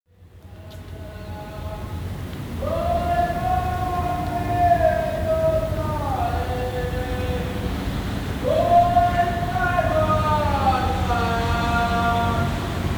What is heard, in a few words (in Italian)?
Fondamenta Misericordia; suoni